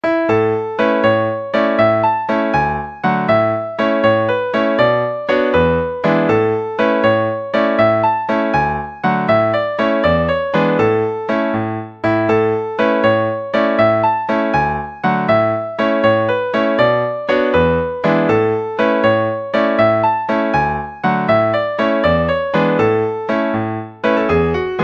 jig; joy street

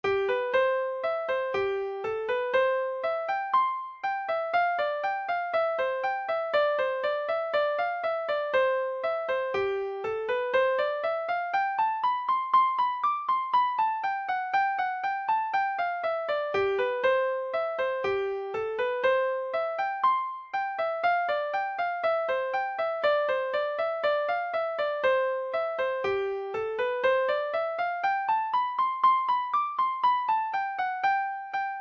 wind hornpipe, folk music midi

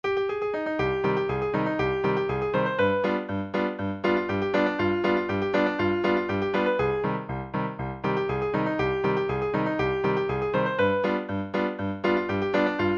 klezmerized, session tune midis

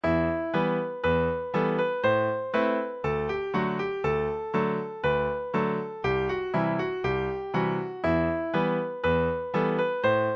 breton dance tune, session tune midis

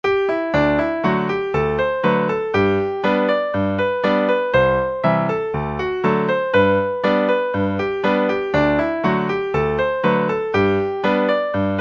bluegrass calypso

